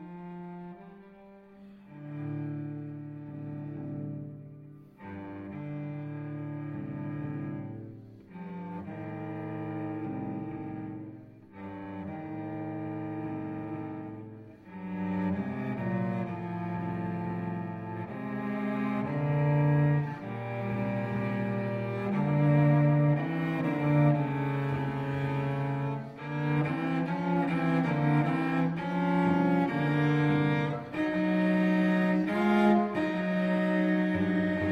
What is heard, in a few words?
Sonata cello